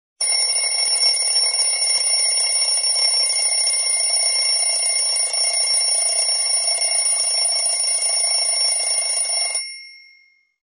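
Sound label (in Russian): staryi budilnik, old alarm clock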